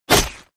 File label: skill bullet; nightingale